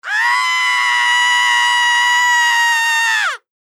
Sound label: scream male high